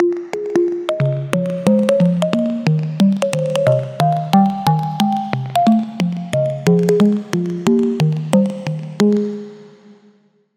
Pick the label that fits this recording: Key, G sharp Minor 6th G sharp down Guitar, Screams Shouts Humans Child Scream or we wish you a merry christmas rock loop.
Key